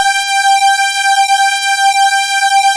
RAVE SYN G6

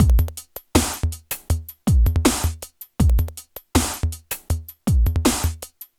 Elektro, Processed Percussion

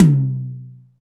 TOM TOM, Real

